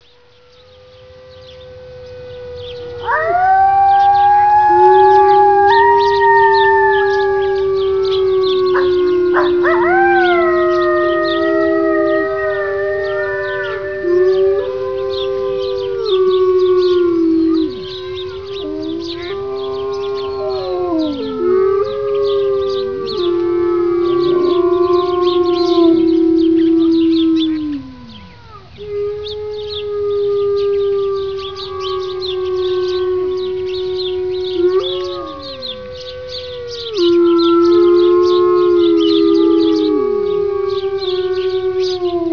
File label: Chorus howling with coyotes